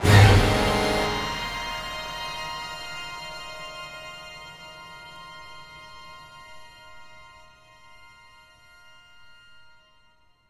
String Chase

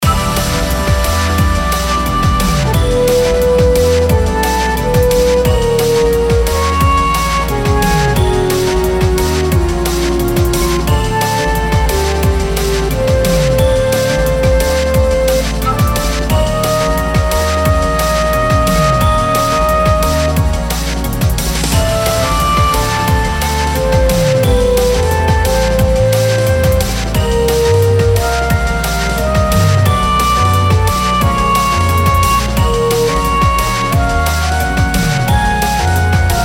Raid Boss; Mist Train Girls

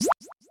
Menu Open

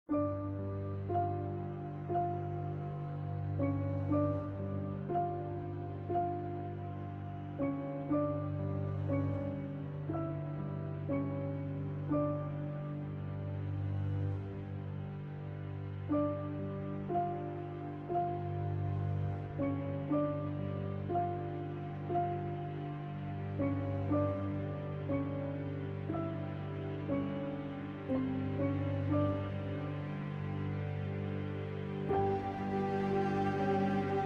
cathedral ceremony; Minoria Switch gamerip 2020

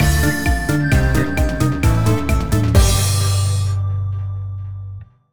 Minigame Failure; Gremlins Gizmo; wii

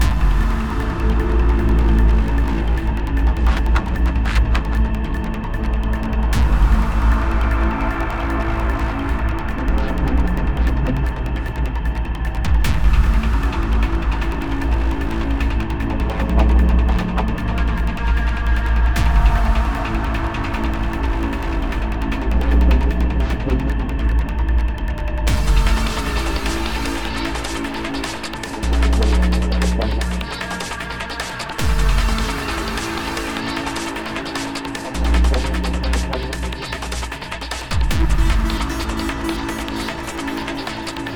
Shooter Game Music

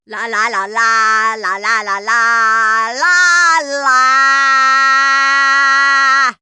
Parl Music

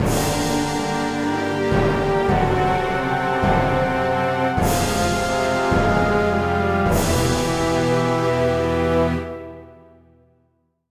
Fail; Heroes Over Europe

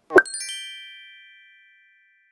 Jump; Tinkerbell

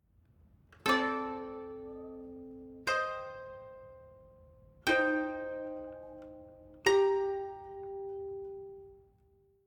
Savannah Music; kenshi windows gamerip 2018

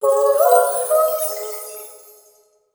gold bonus; Tiestru

